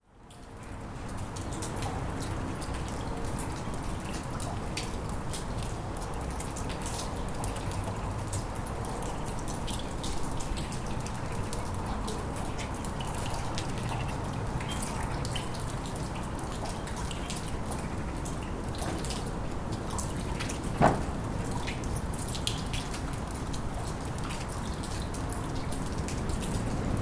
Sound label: underneath railway bridge; galgenbruch